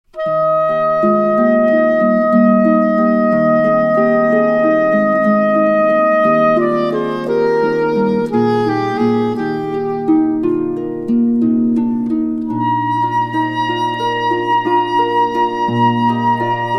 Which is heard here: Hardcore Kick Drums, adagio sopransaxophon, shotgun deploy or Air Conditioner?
adagio sopransaxophon